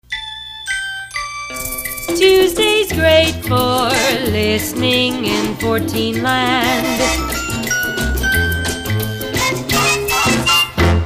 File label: Weathermation Tuesday; KQV; PAMS